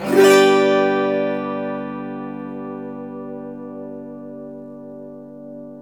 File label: AUTO HARP